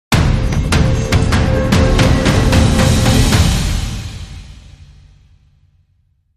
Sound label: Dramatic Sting